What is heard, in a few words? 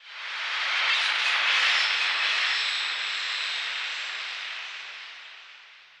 AMBNT 160; eJay; Ambient